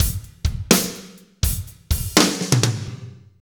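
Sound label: slow shuffle, Roland drum beats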